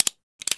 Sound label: Rifle Idle, Sniper Rifle